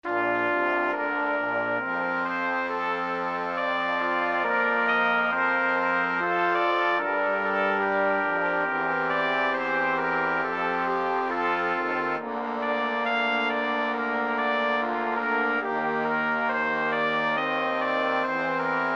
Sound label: Brass Quintet; Fantasy in C